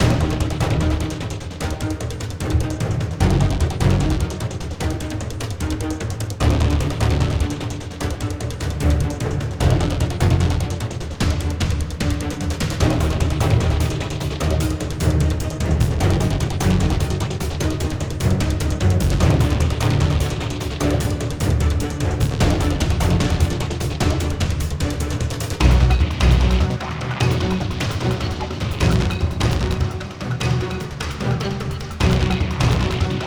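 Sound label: Low Loop, music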